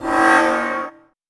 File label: Tonal FX F#